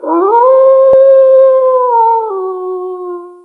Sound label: Cast Wolf